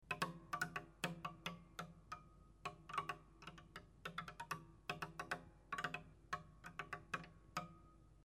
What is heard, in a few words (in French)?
tige roule bois, touchers de la harpe